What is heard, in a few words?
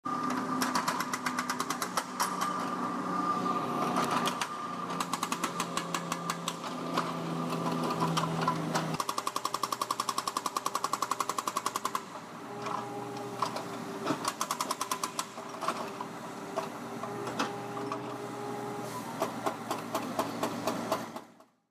jackhammer